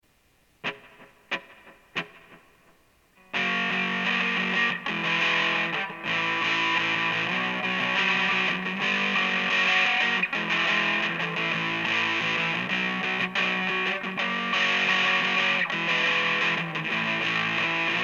rockin

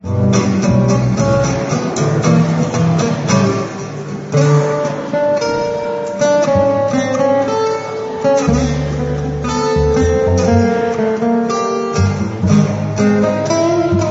soundcheck; swing Gitan

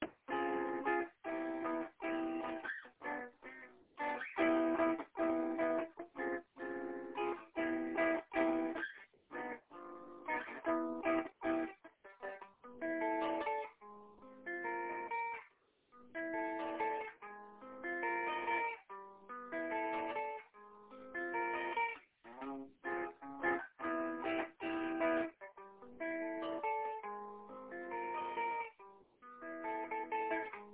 half step up bossa

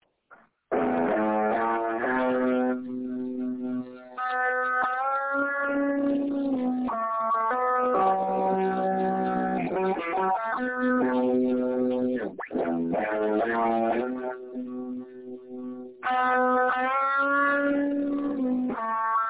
metal ramp up

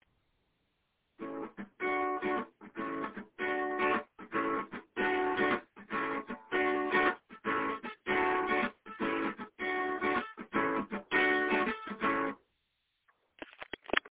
happy skipping jumping

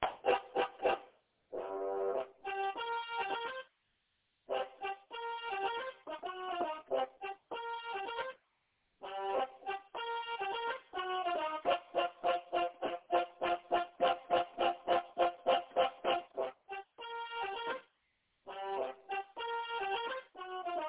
suspensful marchig band piece

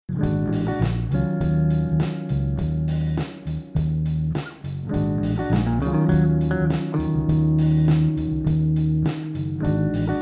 melody

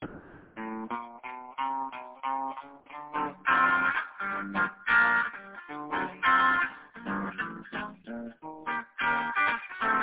walking groovy chords

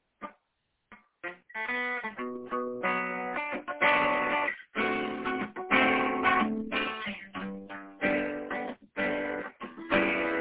chords and melody fragments for a sad song

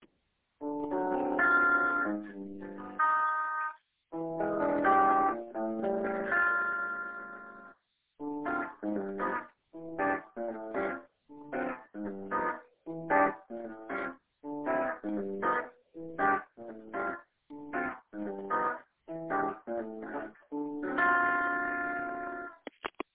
offbeat harmonies in turnaround

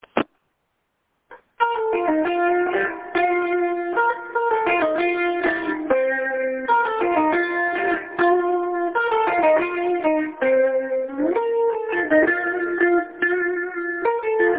epic arena pick it up solo

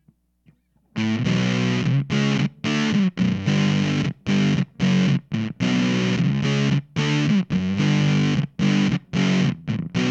Fuzz Sounds fuzzy With Eq

